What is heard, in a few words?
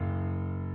key